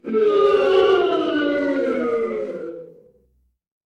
crowd boo

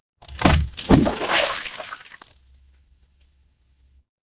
water splash

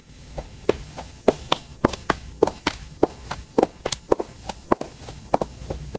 footsteps heels

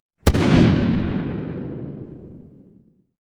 impact explosion

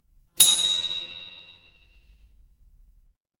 instrument bell

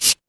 ui send

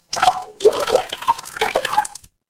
water swirl

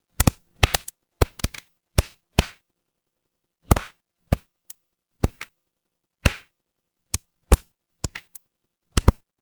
ambience fireplace

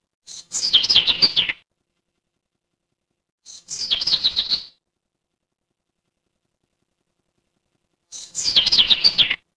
ambience forest

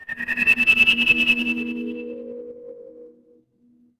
magic scifi alien

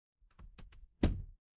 door close

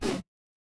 retro game enemy death

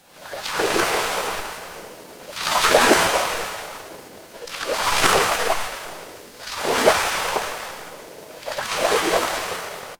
ambience ocean

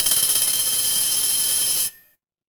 office phone vibrate

